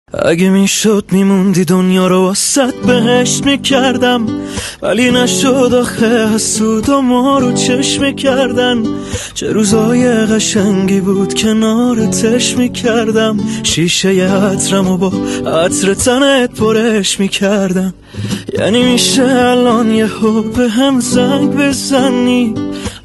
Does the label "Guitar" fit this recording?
Yes